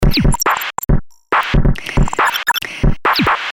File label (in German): rhythmus